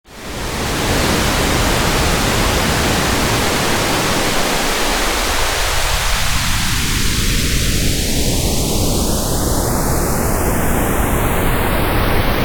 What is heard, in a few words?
VCA Based Phaser 4 Pole Noise